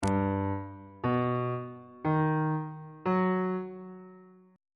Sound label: e harmonic minor 7th chord 3 is g augmented major 7th